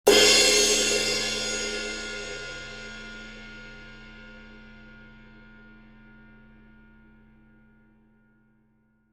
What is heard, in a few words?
21in Sweet Ride Brilliant, cymbal